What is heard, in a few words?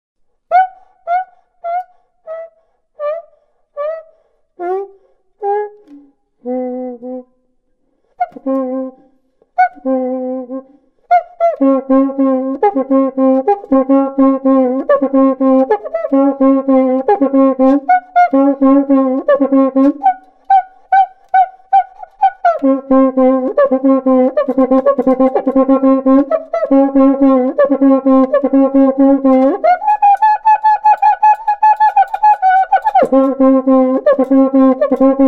Cuica